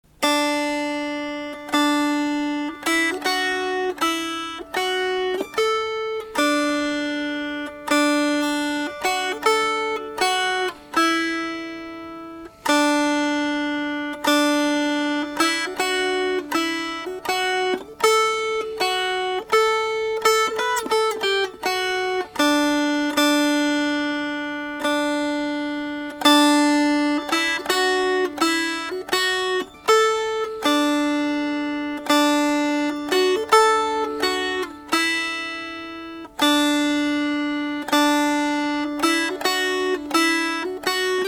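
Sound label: Melody, Dulcimer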